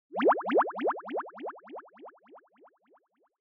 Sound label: Fantasize High; 妄想08-4(高); シーン・妄想